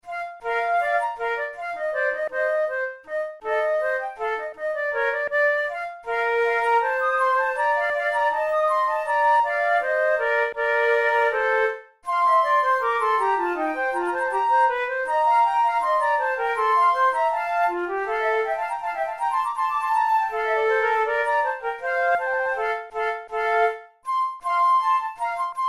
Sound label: pour la flute duos allegro; devienne; nouvelle methode